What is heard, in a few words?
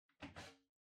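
Ceiling Light Far Remote Control Holder Take Out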